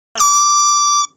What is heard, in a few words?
everythings ok alarm